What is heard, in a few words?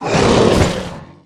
death; NarsElementalKnight